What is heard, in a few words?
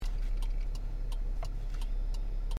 car interior blinker